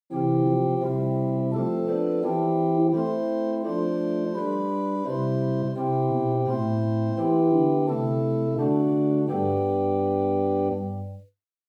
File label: Gt Bourdon8 Spitzfloete4, organstops; First Baptist Riverside